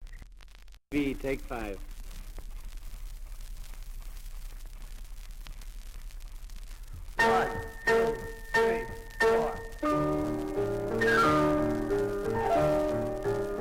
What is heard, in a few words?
Male vocal with piano